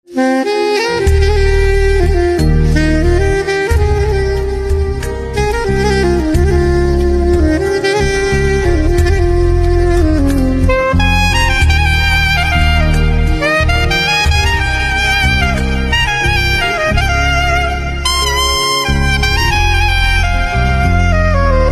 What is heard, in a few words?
Saxophone Instrumental Ringtone